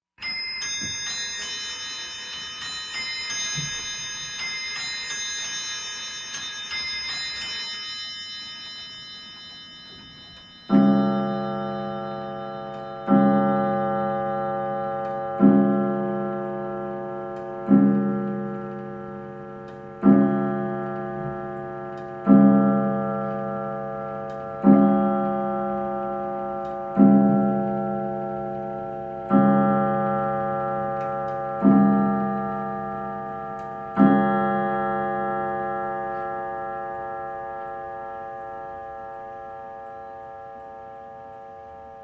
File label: CLOCKS MANTLE CLOCK: INT: 1880 s large mantle clock strikes 11 0`clock, off microphone